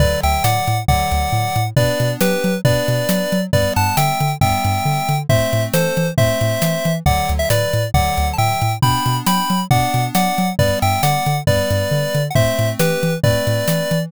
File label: bgm street